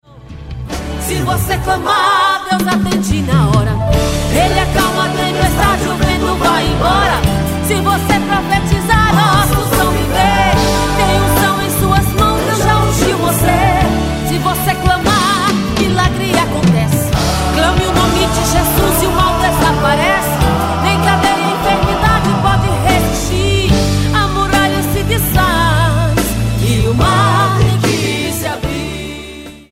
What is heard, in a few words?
ringtone